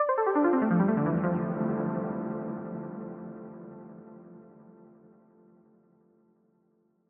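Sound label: f major arp pluck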